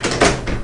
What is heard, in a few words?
door metal shake